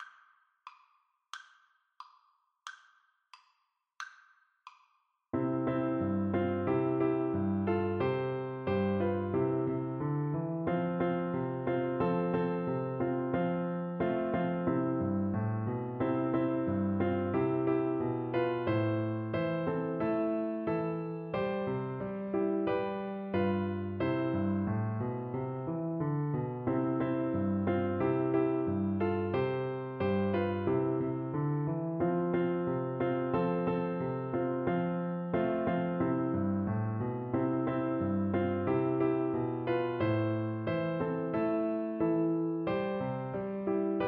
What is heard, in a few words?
Oboe